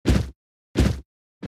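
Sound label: Sound Monster Walk; Starbites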